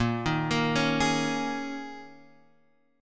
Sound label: G sharp Suspended 2nd Suspended 4th, guitar chord; x,1,1,3,2,4